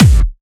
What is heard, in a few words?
Clubby Kicks, Bassdrums